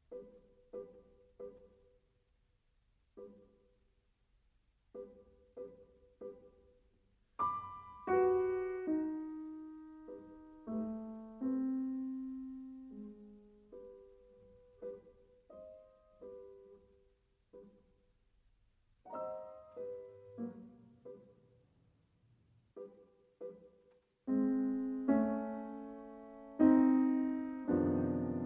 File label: little piano; Schoenberg, Arnold, Six Little Pieces for Piano, / Op. 19, no. 6